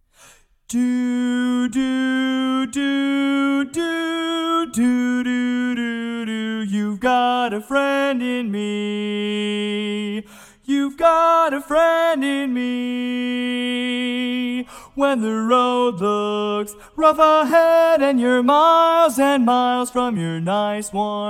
lead SOLO

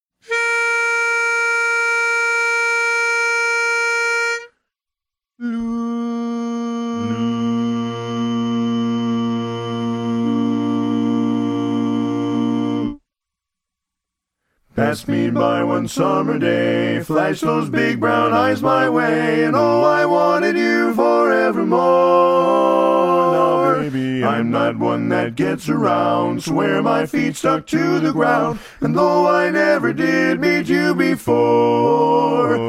Bari missing

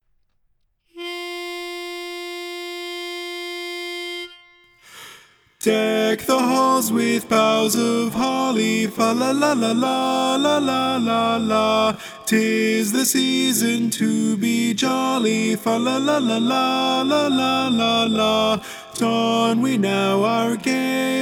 Deck the Halls baritone; mono; Christmas

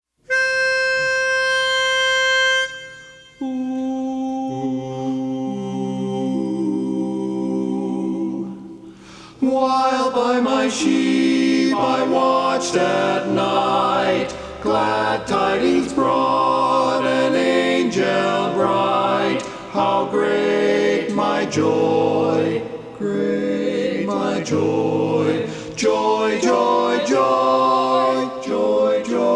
While By My Sheep - Baritone; Christmas

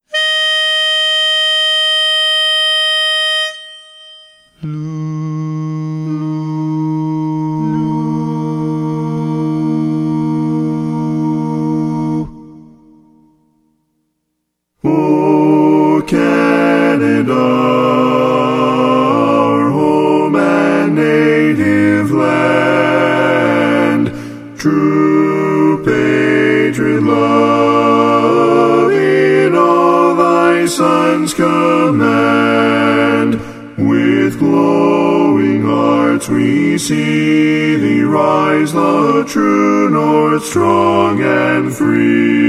O Canada, Bari missing

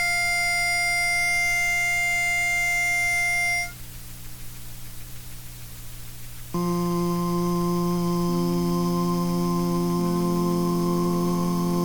bass mono

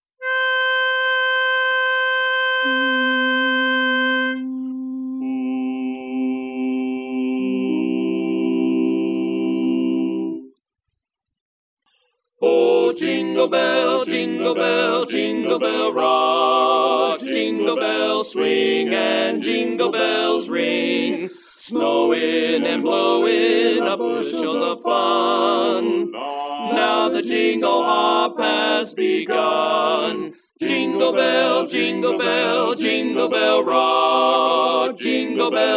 lead; Christmas

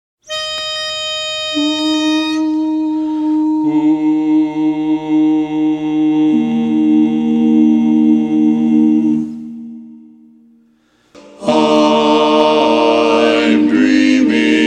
shorter lead missing; Christmas

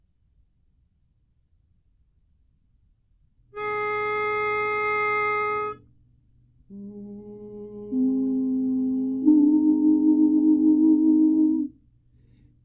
Jingle Bells Tenor; Christmas

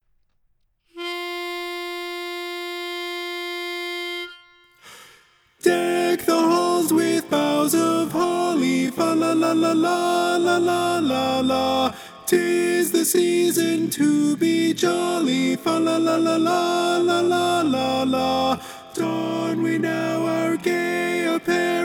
Deck the Halls - tenor mono; Christmas

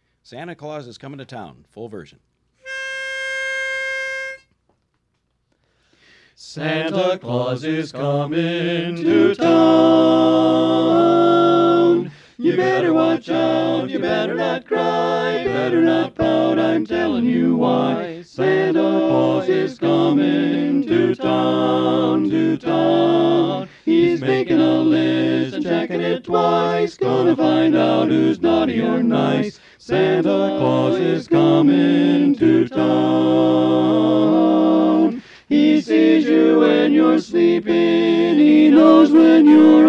tenor; Christmas

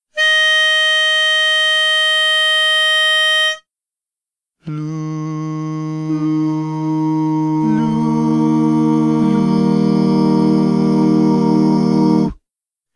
4 part

